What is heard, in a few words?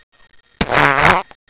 fart